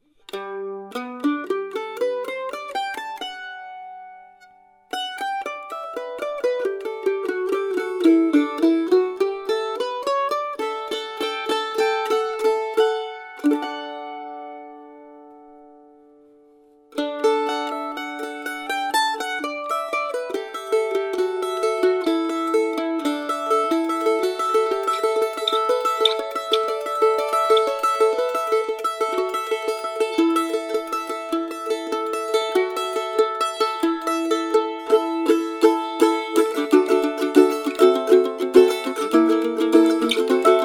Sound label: Mandolins; Heiden, Michael (Kanada) A Style Artist Mandolin A-52 (2009)